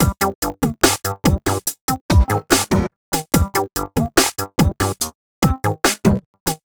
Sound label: Crunch w Syn, Keyboards